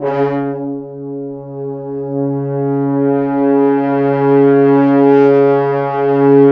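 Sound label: French Horns sfz; Roland L-CDX-03 Disk 2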